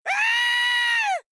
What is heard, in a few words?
aah